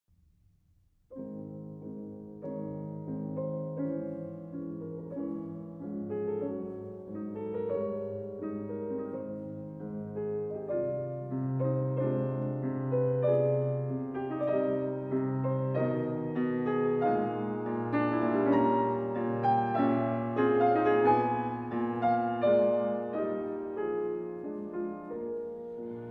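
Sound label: piano quartet; kirchner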